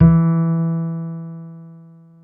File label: PX ACOUS.E 3, Bass; Masterbits CLIMAX 7; PX BASSES